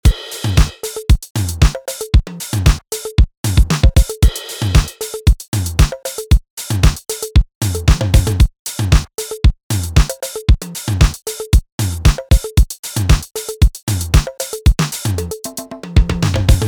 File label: Disco; Drum Blaster: The Ultimate Beat Generator, Sampler Plugin for Modern Producers